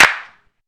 clap sound G# key, Royality free clap tuned to the G# note. Loudest frequency: 2284Hz; percussion; single hits one shots